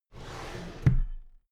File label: drawer cabinet paper close regular halfway